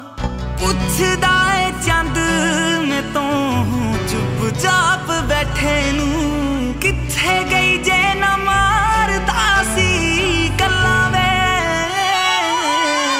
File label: New Punjabi Song Ringtone